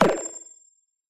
game sound pop with ringing shrill futuristic

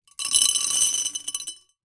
leisure marbles lots pour into glass container; zapsplat

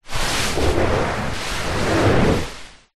household beanbag small slide on carpet; zapsplat

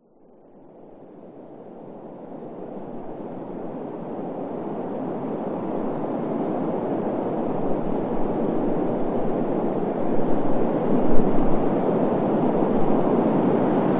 weather wind blizzard like strong constant

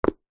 foley footstep single football boot on concrete

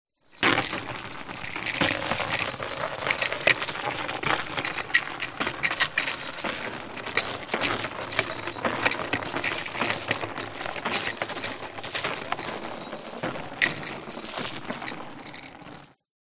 industrial chain rattle drag over wood floor

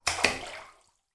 household bath two hands splash down water

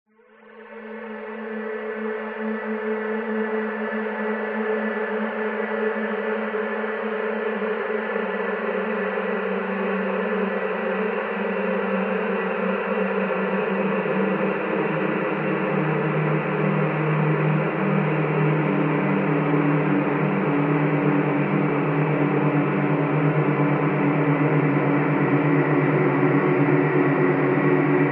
sound design drone dark eerie swarming chaotic

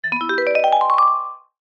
cartoon mystery reveal vibes

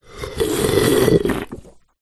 human sip soda from can

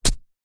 warfare knife dig into grass mud deep

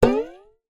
cartoon ascend strings slide classic; zapsplat

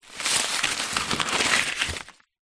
foley paper pieces small pick up; zapsplat

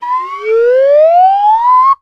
cartoon slide whistle ascend

